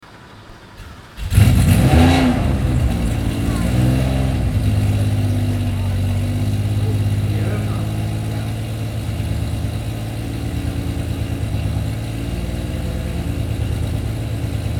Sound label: Porsche 911 Carrera RSR; Porsche Sound Night